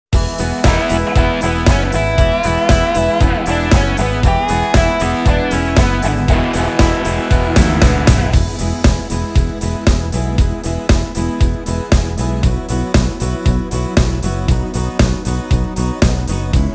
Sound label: Pop Rock